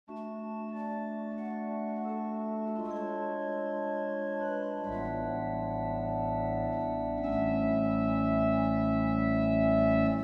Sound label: OrganTeq Dynamic